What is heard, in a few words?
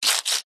foley money australian 5 cent coin slide short on tiled table; zapsplat